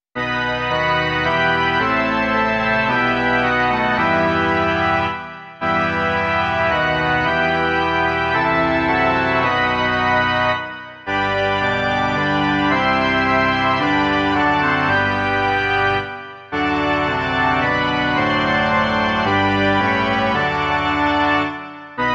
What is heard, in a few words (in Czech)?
varhany